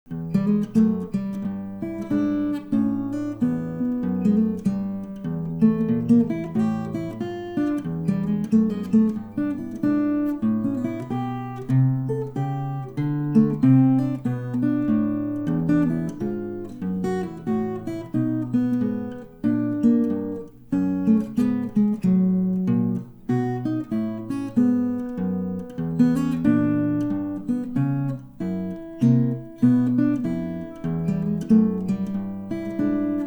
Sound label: blues